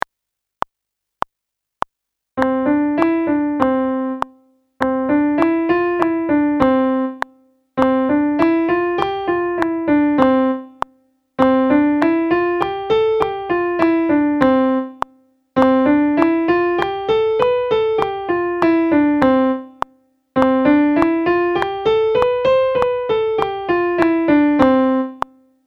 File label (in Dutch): Toonladder C oefening piano